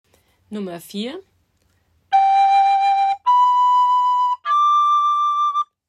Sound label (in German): Xylophon